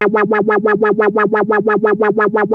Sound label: ARP 2600 F5, synthesizer; Hollywood Edge - Giorgio Moroder Rare Synthesizer Collection